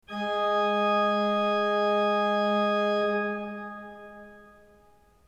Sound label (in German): ton, orgel